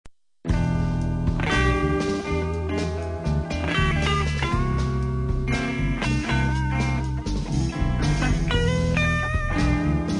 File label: Big Empty LES PAUL